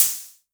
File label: Hi Hats Open; LIMAT Div Dept